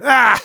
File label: negative vocalization